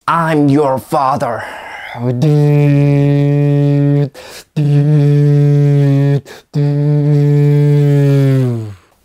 Lightsaber

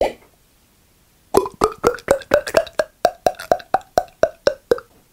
Pour Water

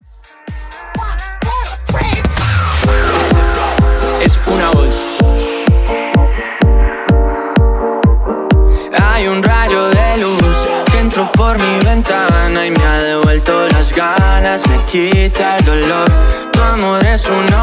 ElectroLatino

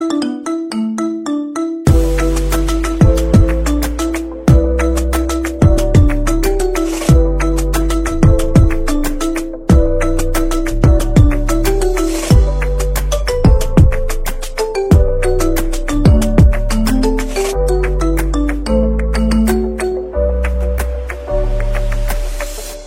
Marimba

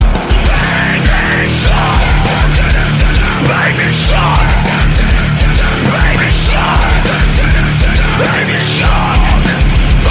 metal

